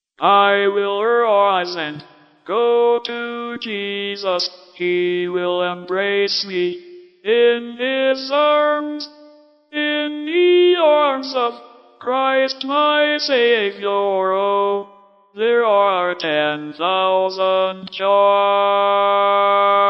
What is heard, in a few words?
VOCALS ONLY